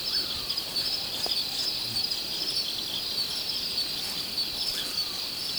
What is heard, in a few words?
Nyctiphrynus ocellatus, OCELLATED POORWILL, Call recorded Estancia Kanguery, PN San Rafael; Alberto Esquivel November 2006